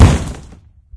stalactite hit; video games; ambient; source engine; portal2; outland

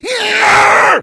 rage at victim, infected, male; Left 4 Dead 2; Source Engine

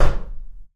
wood door knock

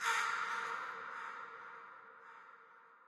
amb crow; video games; ambient; nature; source engine; portal2

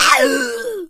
smoker pain short

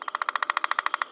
peckr, forest; ambient; source engine; ep2

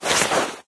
taunt yeti standee clothes rustle swing; player; medic; source engine; tf

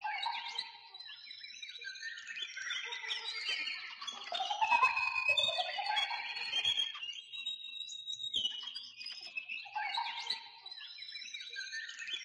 vista birds, ambient lair; video games; Source Engine; tf

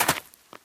gravel, player footsteps survivor walk

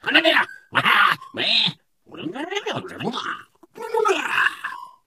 ballbot arm wave

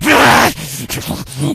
rage at victim, infected

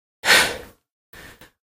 stalk breath; source engine; episodic; episode 1; citadel

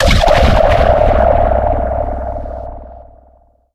stun; source engine; tf; halloween; merasmus

